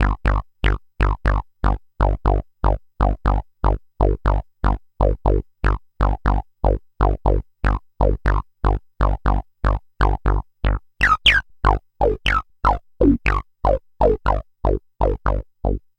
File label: Bass, EDM; Sonic Foundry (Sony Creative Software); Afterhours EDM